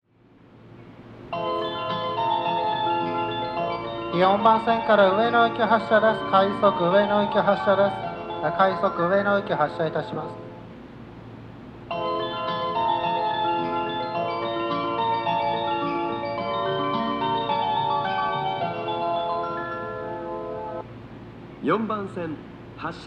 melody, station

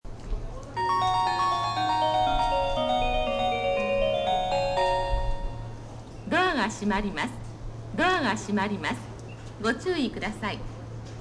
melody

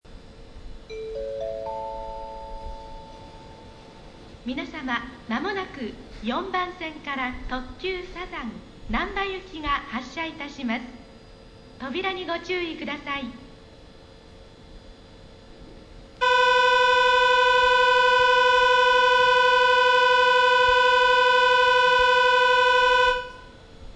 bell; 4番線発車放送＋出発時機合図; 和歌山市; 和歌山市駅では、なんば駅などと同様のタイプの放送を用いています。; 特急サザン

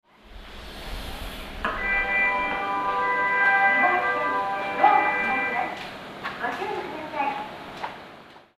melody, station

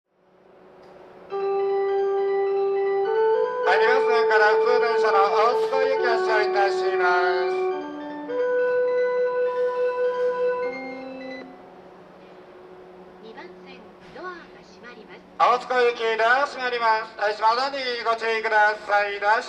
2 melody, station; matsudo; joban; jreast

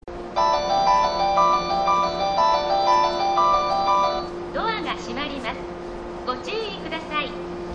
melody, station

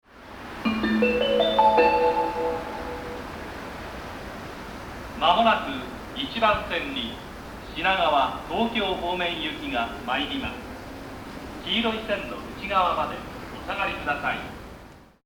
1an, jreast yamanote; gotannda; 五反田駅; 1番線（山手内）接近放送; 通称「東海道型」の放送; 五反田駅では、通称「東海道型」の放送が使用されており、導入当時は五反田・目黒・原宿のみの使用でした。

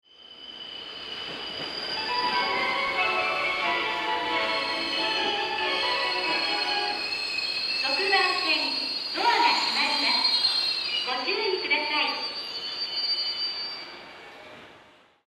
melody, yamanote; tokyo; 6番線（京浜南）発車メロディ; jreast

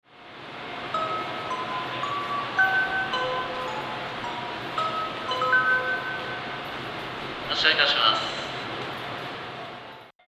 melody; takadanobaba; 5番線発車メロディ; seibu

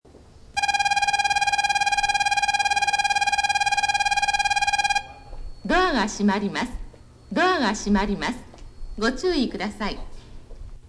bell, osakaloop

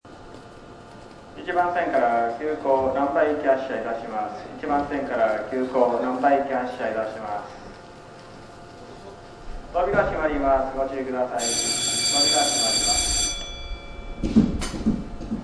1bell

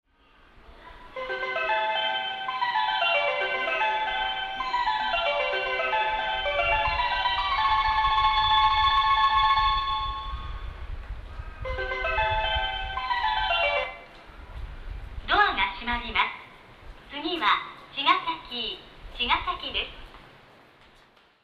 melody, Hiratsuka, jreast tokaido

